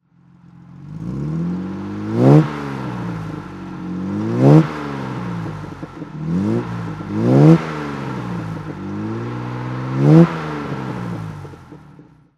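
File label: jaguar xk150 fhc throttle, engine sound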